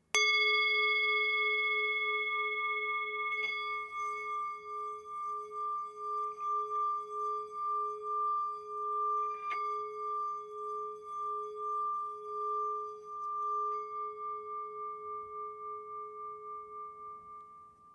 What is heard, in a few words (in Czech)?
tibetska misa; Tibetská mísa Jinpa malá; palička na hraní; Obrácený zvon, meditační mísa, léčivá mísa, himalájská zpívající mísa, tibetská mísa